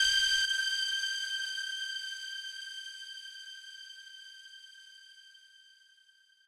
lead

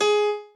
piano

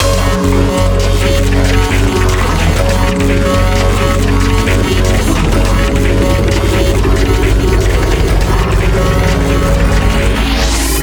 BGM; Luxembourg Zwee

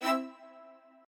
strings

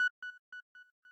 arp

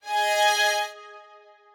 strings

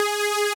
lead

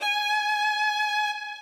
solo

